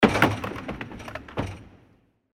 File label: door closes